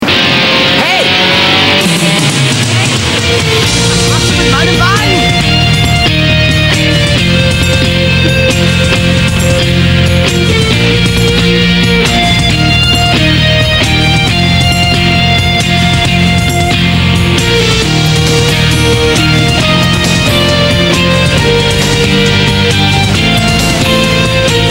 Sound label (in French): guitare; Partir sur TERROR ISLAND; Musique du 1er épisode